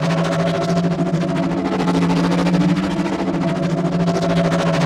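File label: sith speeder engine loop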